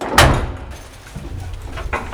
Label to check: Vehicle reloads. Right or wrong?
Right